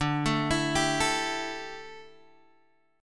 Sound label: D Suspended 2nd Suspended 4th D down Guitar Open G